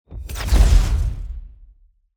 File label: Shot; TANK; 3Souls